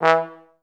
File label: E2, STAC; Best Service; Peter Siedlaczek's Advanced Orchestra